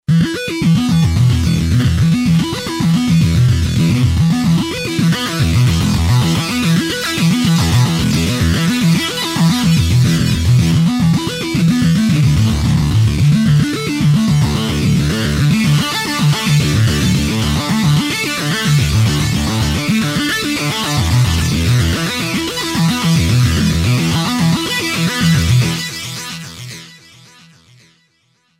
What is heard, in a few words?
v-synth dist saw